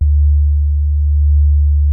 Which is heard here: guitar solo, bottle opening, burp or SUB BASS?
SUB BASS